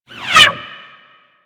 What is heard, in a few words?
Bullet By; Grineer Arch Skiff